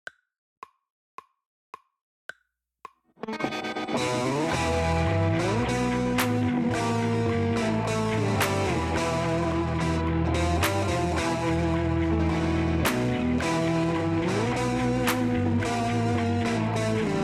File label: rat Rod drumless